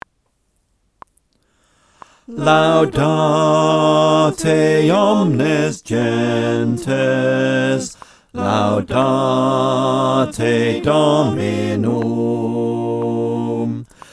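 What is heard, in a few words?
bass, choir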